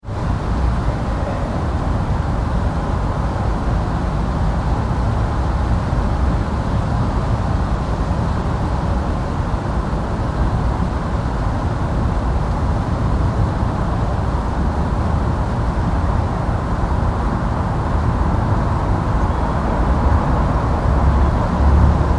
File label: City Atmosphere 1, Late night city roar - recorded from high angle; Cities; Tom Hutchings